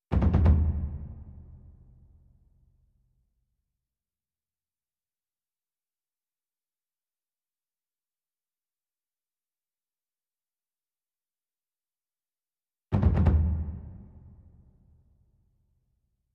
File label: Taiko